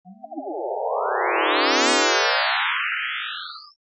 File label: line 2 sine